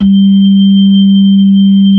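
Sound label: B-3 Brite, organ; Roland L-CDX-02; Hollow Slow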